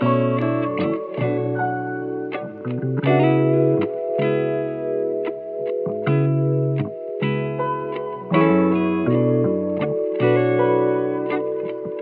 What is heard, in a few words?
piano and guitar, loops, phonky